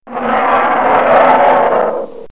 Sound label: Bear Growling Sounds Car Horn